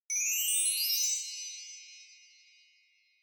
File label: Wind Chimes Up High